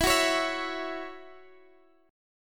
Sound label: C sharp Minor Double Flat 5th E, chord